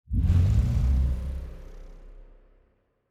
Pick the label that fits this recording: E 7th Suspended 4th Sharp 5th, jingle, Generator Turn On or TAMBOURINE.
Generator Turn On